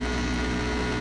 starfire; pod skyrace

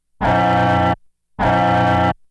fuse; pod skyrace

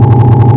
lawnmower; terminus

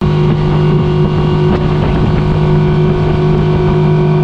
jet

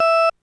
countdown

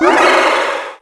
shock fire

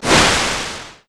bomb hit

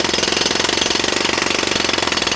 lawnmower

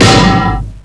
roadcone

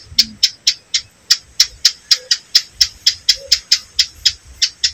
birds

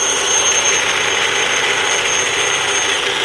lawnmower

